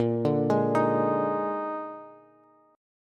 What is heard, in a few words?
Bb 11th, Bass; Bb11 arpeggiated; JGuitar